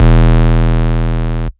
Root E, Bass

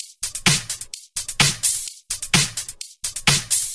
Minimal Loops

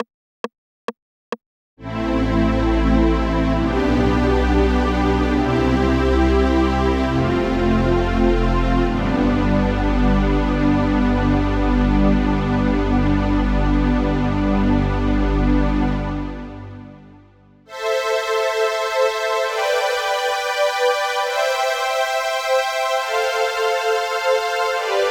String (open), 136 BPM; Vengeance Samples

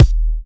Bassdrums Breakbeat; Vengeance Samples; VEC3 Bassdrums; Breakbeat Kicks

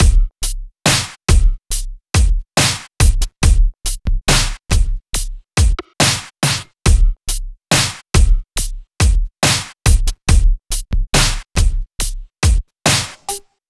Slomo Beat 140 BPM; Vengeance Packs; vengeance essential club sounds-3; VEC3 Loops 140 BPM; VEC3 Slow Motion Beats 140 BPM

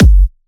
125BPM Kick; Vengeance Samples; Cutter Kit

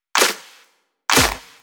Clap, 126 BPM; Vengeance Samples; Vocal-Hook Kits; Song Kit

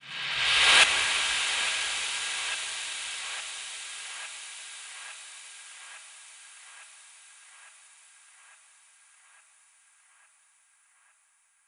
Reverse 140BPM, Sound FX; Vengeance Samples; D minor

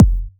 Bassdrums

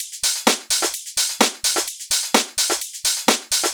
House Loop, 128 BPM; Vengeance Samples

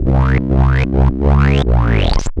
Roland C Line, Roland S100

